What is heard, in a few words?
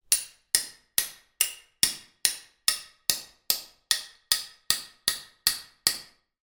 industrial metal hammer impacts on metal pipe small slight reverb